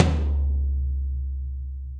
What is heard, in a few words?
Tom High Floor Ambient Loud Punch; Drums; Drum Hits; Ableton; Session Drums Studio; MPE